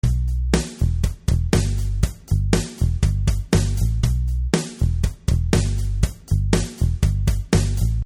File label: Map Music; kickbuttowski; mellowbrook mayhem